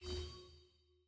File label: purchase button hover